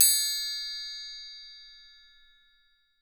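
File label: TRIANGLE, BELLS CHIMES; Voice Crystal - Steve Reid Definitive Percussion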